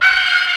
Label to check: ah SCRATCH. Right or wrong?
Right